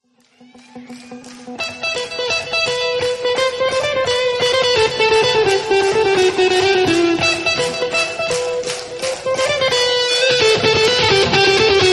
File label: Tarantella Guitar Solo